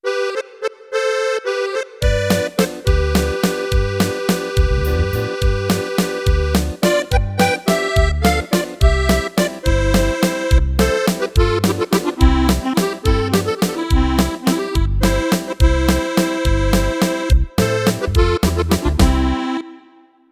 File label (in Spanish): Vals